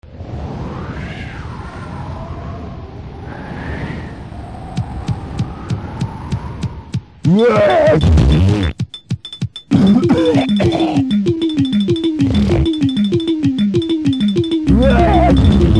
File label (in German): erkaeltungstechno